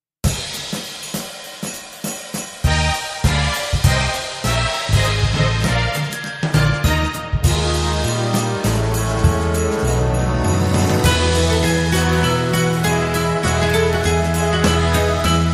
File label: Deck the Hall (jazz classic) - Short track